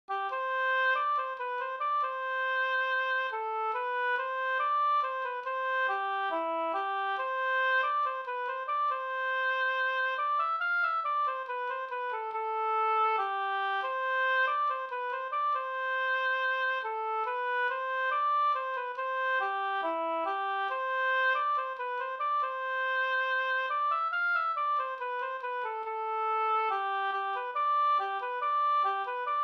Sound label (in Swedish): skanklat